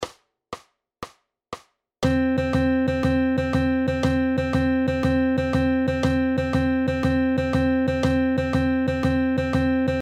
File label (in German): Achtel Noten Swing Phrasiert; eighth notes that are swing phrased